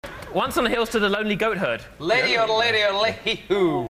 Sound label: yodel